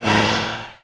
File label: NPC Hit